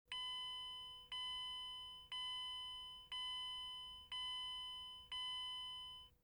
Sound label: car beeping; trasporti; auto